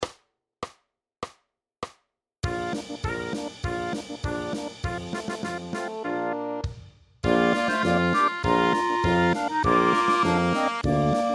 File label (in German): hexen polka